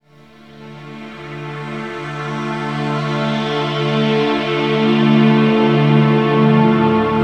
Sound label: Pad, Jungle; Pads; Blu Mar Ten; Jungle - 1989 to 1999